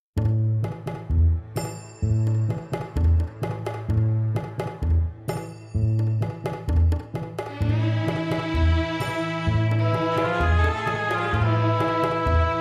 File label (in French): instr